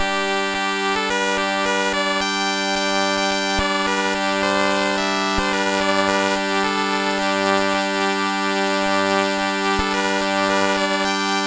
sid MOS6581R3

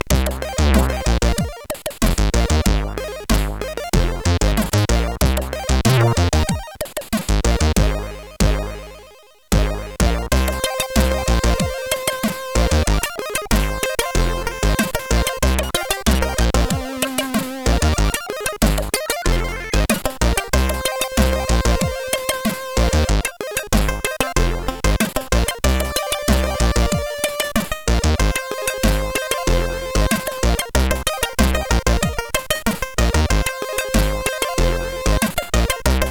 Strange Solo sid CSG8580R5; Longhair

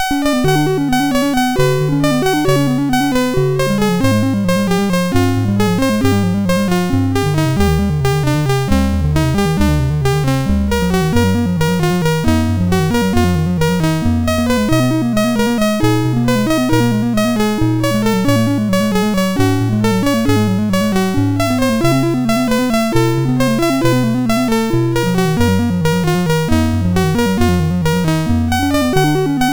Randomly generated music BASIC, sid MOS6581R2

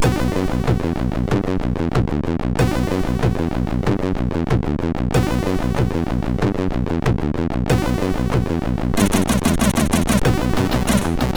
Techno Chaos, MOS6581R4

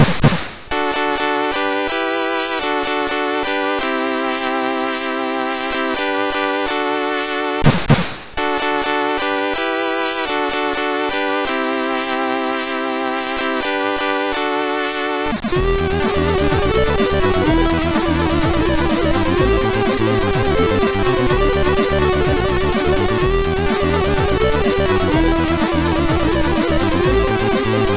remix sid MOS6581R4; Starquest

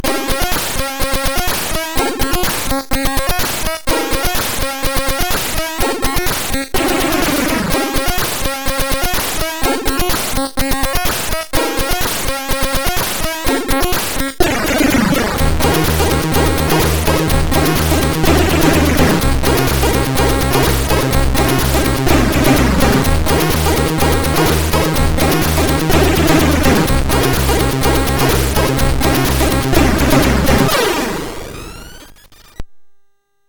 Game Music, sid MOS6581R2

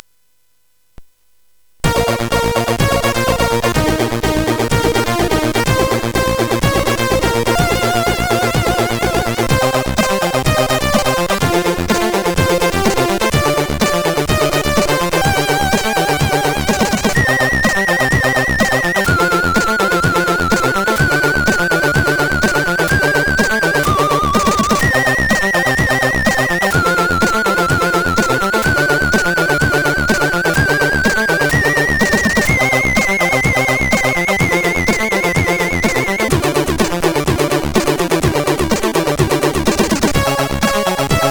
Intro, CSG8580R5; X-ample; hvsc

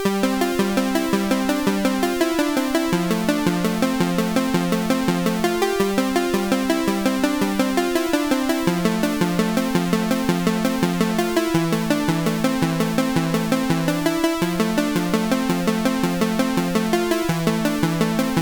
Tunes, sid MOS6581R3

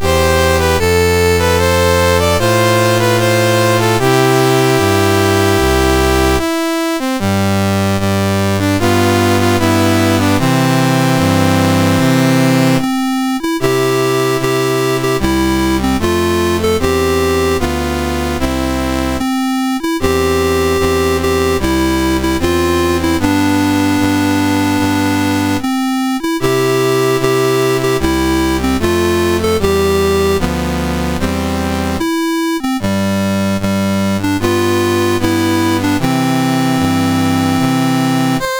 Zaire, National Anthems; MOS6581R4; Dave Marquis

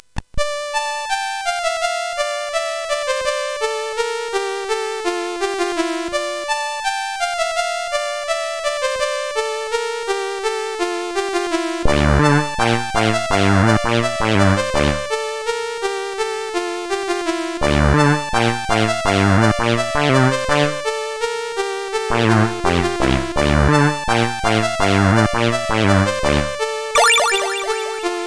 Casio, CSG8580; CSG8580R5; hvsc

